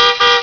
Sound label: CAR HORN